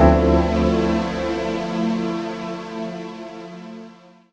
Breeze Chord; M8

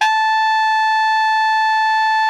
ALTO FF A 4, saxophones; Greytsounds; Philip Wolfe David Crozier's Saxophones

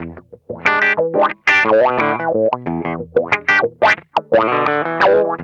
CROAKIN, Funk Guitar; Zero G - Funk Guitar

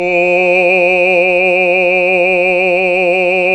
BASS, Roland

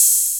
RY-10, DRUMS + PERC, YAMAHA

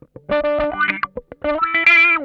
OUCH, Funk Guitar; Zero G - Funk Guitar

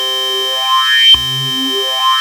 OSCAR C3, synthesizer; Hollywood Edge - Giorgio Moroder Rare Synthesizer Collection

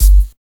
HH, Hip Hop R n B; USB Soundscan vol.19

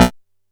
B2, Bass; E-MU Producer Series Vol. 6 – Analogia Project; Boom da Bass

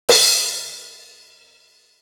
Cymbal; 90 sSampleCDs; Coldcut's Kleptomania VOL1; Drum One Shots

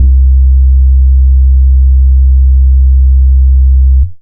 Single Electric Basses, Dance Floor Bass

